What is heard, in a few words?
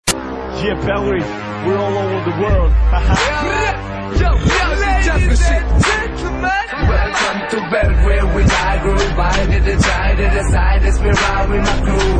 hip hop music